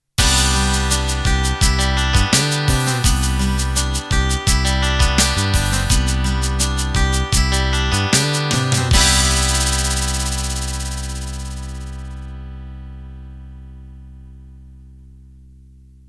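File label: Rock Ballad End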